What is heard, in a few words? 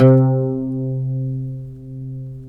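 pluck OUD C; Roland - LA Composer vol. 3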